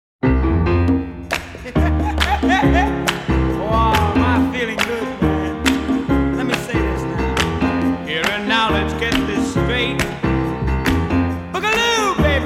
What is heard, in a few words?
Cha Cha Cha